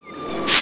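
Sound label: FX REVERSE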